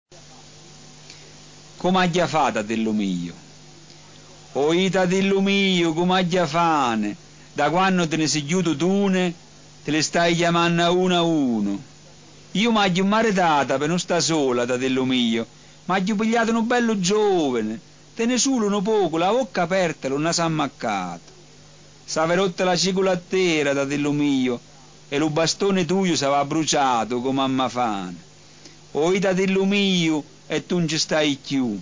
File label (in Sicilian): TATILLU MIJU CANTO ANTICO